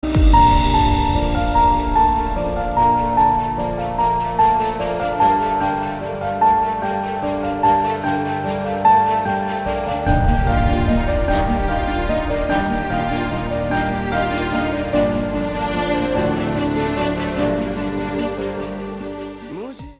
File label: Instrumental